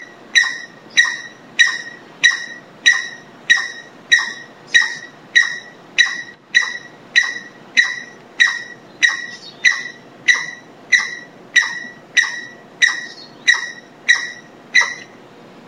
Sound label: Aramides mangle, Ilha Comandatuba; BA BR